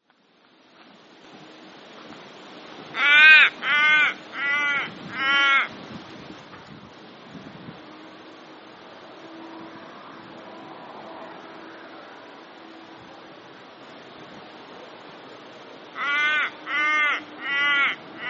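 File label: Little Raven dying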